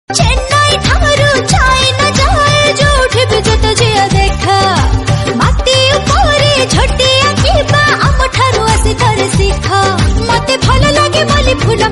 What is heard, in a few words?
odia love song